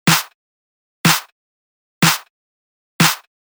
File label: Drum Set 123 Snare